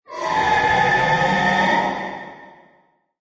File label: Dinosaur Scream, Jurassic creature or monster, screeching, vocalizing; Horror